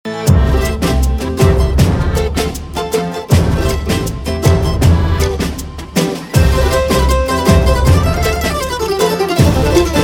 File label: Instrumental Ringtone, Bollywood; Tamasha